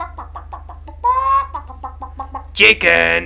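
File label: chicken, Dieren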